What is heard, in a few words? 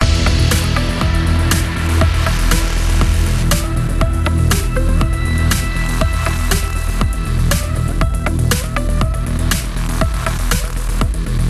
NIGHTPULSE 120BPM; PMSFX